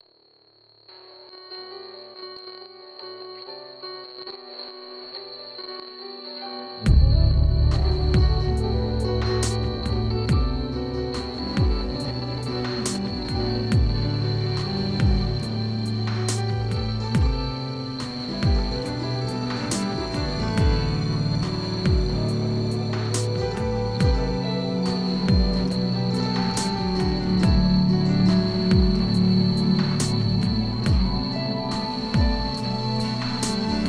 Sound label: Atmospheric